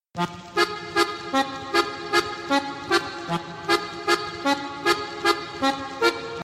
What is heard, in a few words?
Carousel